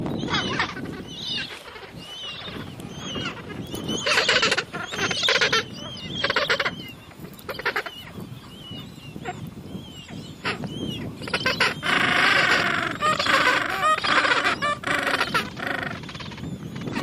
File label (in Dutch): gentoo, pinguins